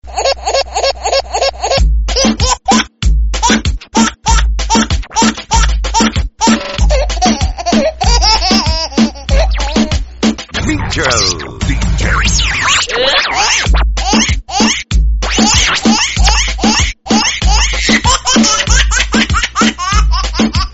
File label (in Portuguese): Bebe Muito Engracado